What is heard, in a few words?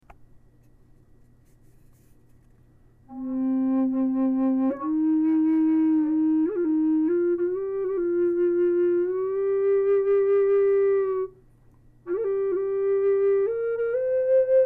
Low C Cedar, flutes